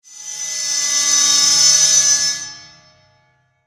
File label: Metal Scrape, Production Element, Imaging Element, Accent, Transition; Warped